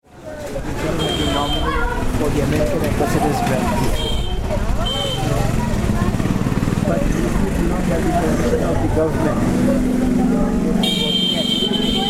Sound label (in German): Shakhari Bazar strassenatmo; bangladesch 2009